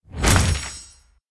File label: Confetti; Angry Birds